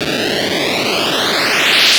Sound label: player dead; arcade-canvas; defender